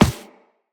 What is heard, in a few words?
Walk Default, Footsteps; Operator